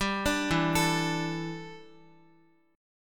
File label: E Minor 11th guitar chord; Em11 arpeggiated; JGuitar